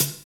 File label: HAT SNARE, Hi-Hats, HH Modules; Roland L-CD701